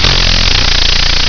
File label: pluck; LibreOffice